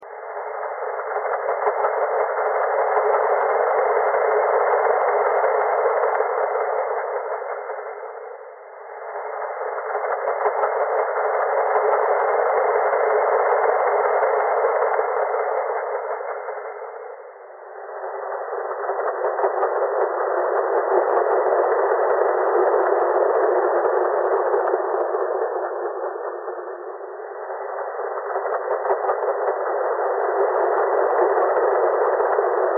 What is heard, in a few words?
thread simplebuzz